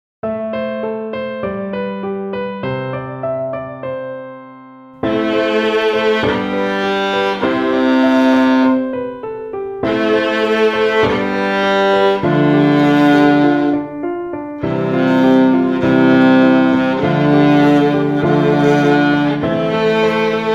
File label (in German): glockenklang